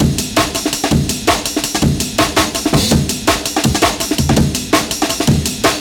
165 Break, breaks; M8

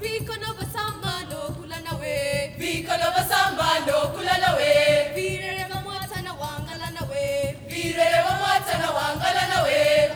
AHO D2; Spectrasonics; Heart of Africa 2

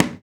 African Perc

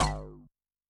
IRON BASS; Yamaha DTXpress-II

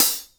Hi Hats; InVision Interactive; Lightware VOL-1

